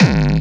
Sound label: Hard Kick